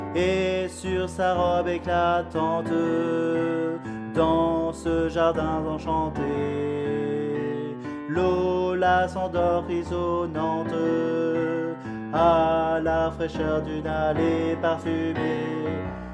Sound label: Alto